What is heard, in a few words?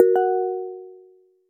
system notifications; Amazon Fire Phone